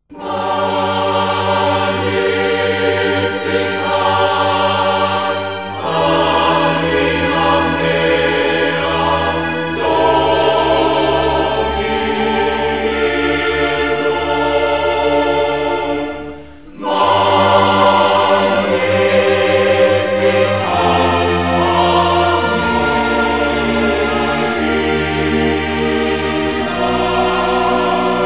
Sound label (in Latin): Magnificat anima mea Dominum; Antonio Vivaldi; Magnificat RV 611